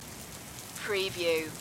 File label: rain stereo loop short